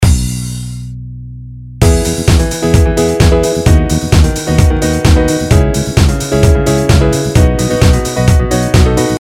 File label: rhythm